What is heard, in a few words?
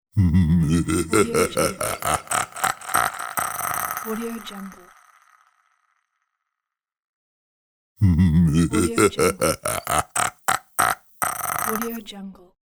evil sinister giant laugh; cartoon